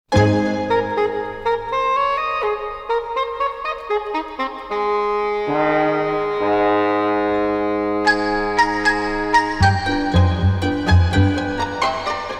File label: Pizzicato Pursuit, Orchestra, Strings; KPM 1000 series